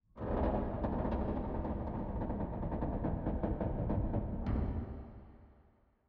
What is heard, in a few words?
ambience sound; netent